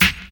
snappy snare sound F sharp key, Royality free snare one shot tuned to the F# note